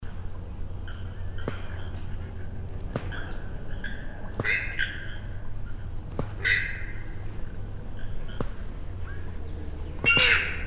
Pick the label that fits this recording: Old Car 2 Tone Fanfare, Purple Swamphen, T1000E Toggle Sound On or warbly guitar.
Purple Swamphen